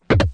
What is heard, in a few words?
head throw; SFX Abilityspeed